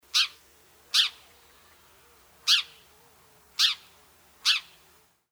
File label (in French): moineau domestique